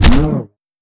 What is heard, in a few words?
axe hit flesh; weapons; tf2